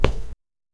stepping sound, far

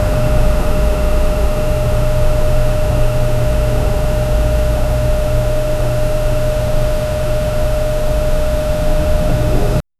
empty hospital corridor a, air-vent

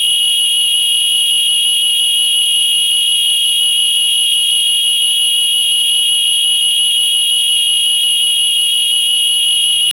sharp repeating high pitc, evacuation

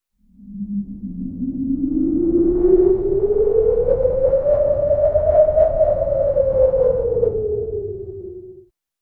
intense faster than light

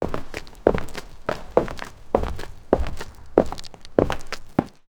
medieval armored soldier

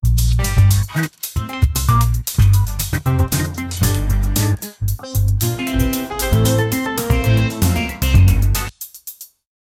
montagem funk

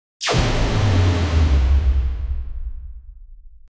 windows pulse shutdown, interface

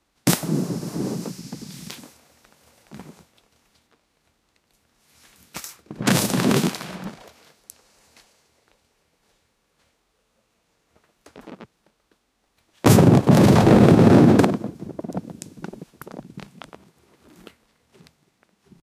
long psychedelic fall of, falling

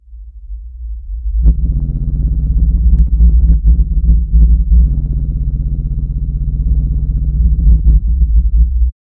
a constant low rumbling, machine

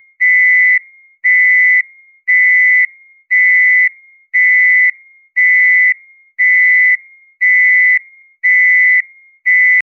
old phone alarm

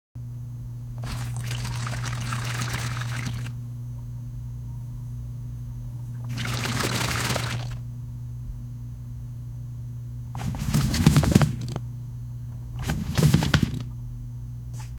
cards flushing sound

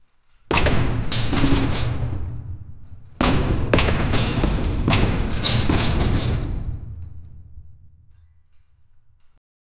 heavy resonant footstep, armor

